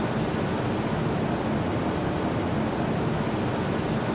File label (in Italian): ambiente, Train; TMB-S9000; OpenBVE